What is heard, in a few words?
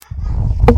chair pull